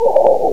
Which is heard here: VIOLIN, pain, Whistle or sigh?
pain